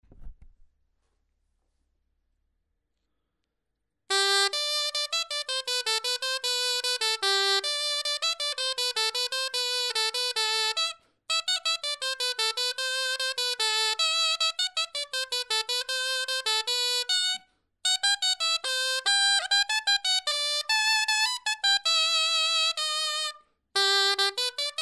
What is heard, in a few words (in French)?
clarin etude